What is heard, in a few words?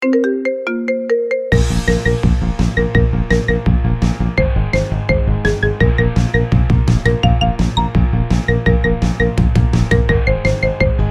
Marimba Remix; Orange Is The New Black